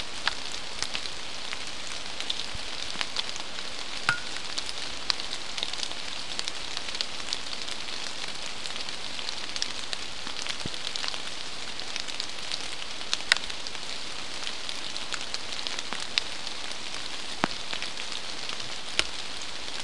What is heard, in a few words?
mainly rain